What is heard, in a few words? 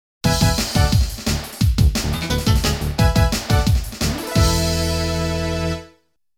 Winner's Fanfare